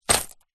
purse pouch leather containing coins and notes catch; foley